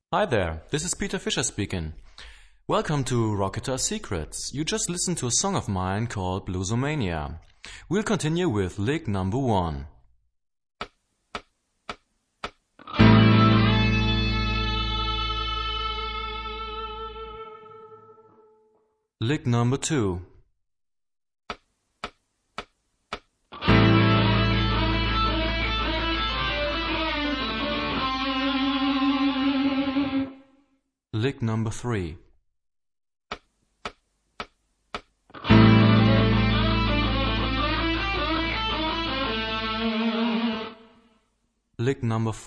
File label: Rock guitar; Rock gt Secrets